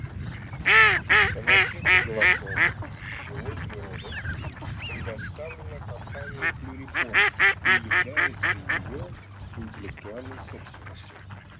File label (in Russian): Кряква Germano; plurifon